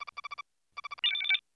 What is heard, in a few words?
eng beep; trek